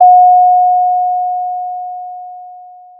Sound of a doorbell

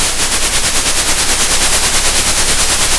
pop, Sound of a train

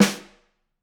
Snr, drum

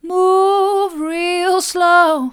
move real slow mid, vocal

